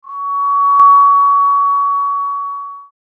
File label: tinitus, sin